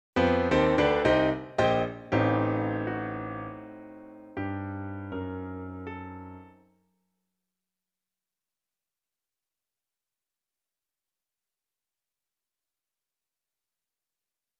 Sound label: solo for oboe and piano; paladilhe